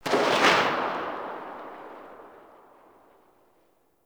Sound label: Pistol Tail; weapons; Warframe; Grineer; Orokin